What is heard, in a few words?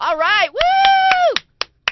woo; griffey